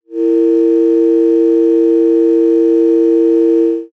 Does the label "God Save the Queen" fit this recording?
No